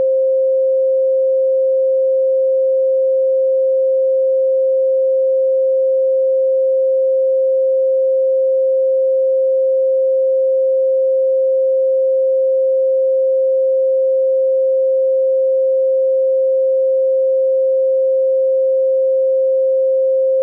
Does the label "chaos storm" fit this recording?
No